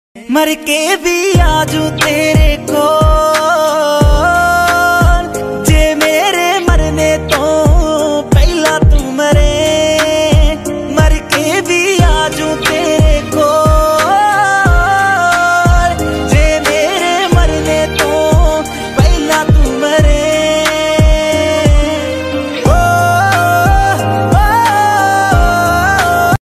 ringtone; lover

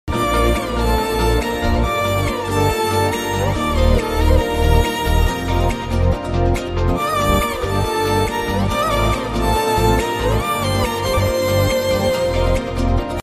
song bgm ringtone violin instrumental

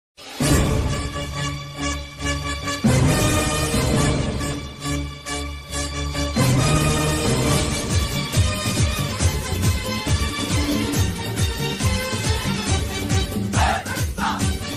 narasimha movie mass bgm ringtone

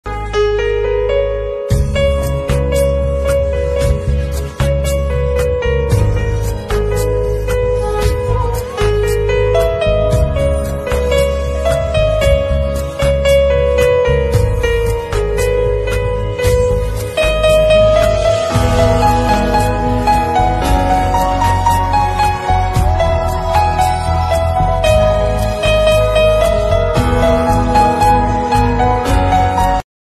old hindi ringtone

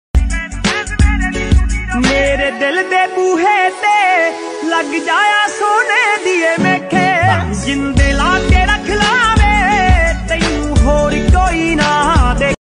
best mobile ringtone Punjabi popular song

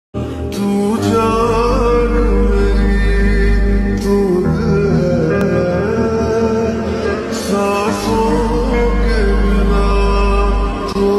lofi slowed reverb ringtone

